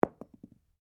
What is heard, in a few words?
Cartridge 38 special impact carpet, shell casings; shell casings of different calibers impacting on different surfaces